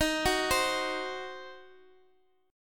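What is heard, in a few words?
E flat Minor 6th; Ebm6 arpeggiated; x,x,x,8,7,8; JGuitar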